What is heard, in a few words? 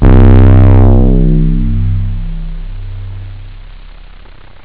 juno, synth bass